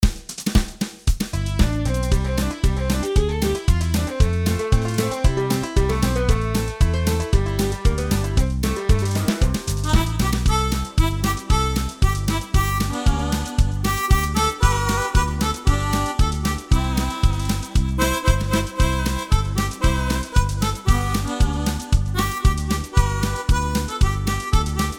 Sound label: Country; AiR Symphony